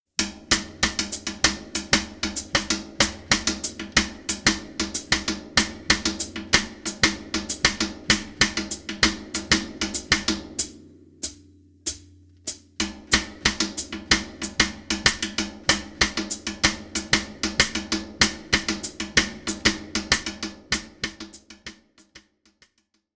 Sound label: Cascara-Pattern, Latin; Schwarzes Album; Bum Bum Tschack Jazz Latin